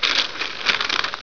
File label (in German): Papier